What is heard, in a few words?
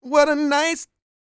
SING, Vocal Planet R&B & Dance; ILIO